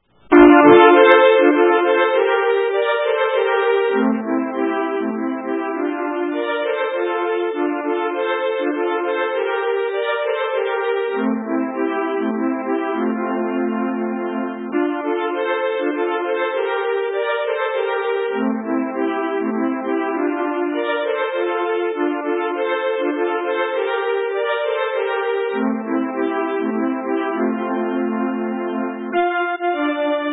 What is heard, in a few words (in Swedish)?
Polska